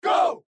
crowd go

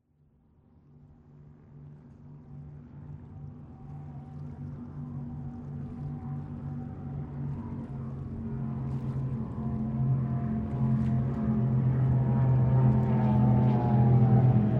Boat Stopping

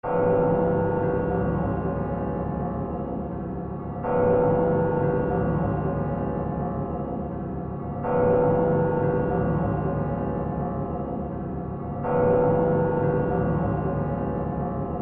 clock chime eerie

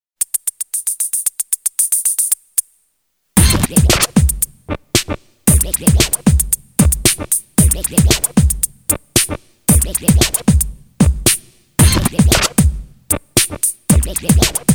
Gritty Pop; Soundzabound Volume 3

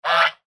goose honk

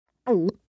Gulp

